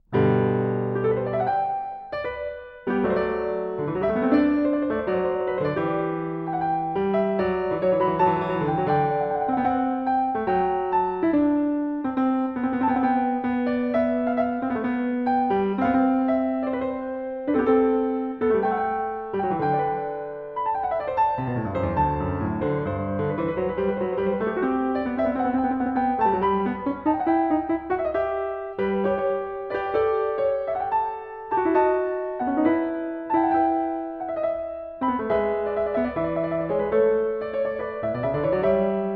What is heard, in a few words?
Goldberg Variations, Variation 16 a 1 Clav. Overture; Bach; The Goldberg Variations, Zenph Re-performance; BWV 988